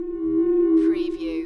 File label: Space Scifi Sound - core hum